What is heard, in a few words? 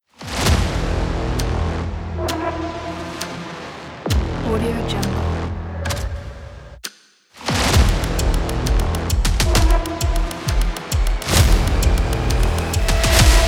action trailers